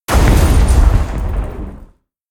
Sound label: protect death